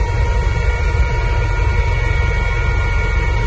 inside cell high stress loop stereo, Lynx internal audio loops